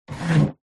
household chair wooden old slide scrape wood floor short